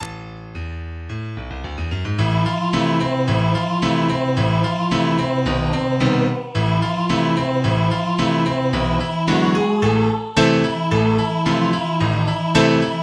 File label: Piano; Poppy Playtime